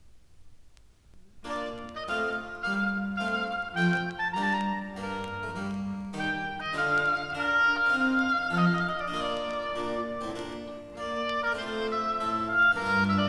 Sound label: Telemann Tafelmusik3 Oboe Sonata